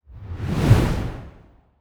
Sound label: super woosh